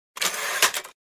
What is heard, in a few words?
Electric typewriter carriage return